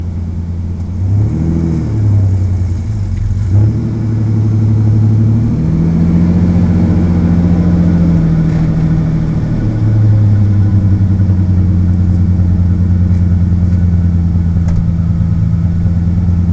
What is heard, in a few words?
Ranger Flowmaster Magna Cat Idling Fast Idle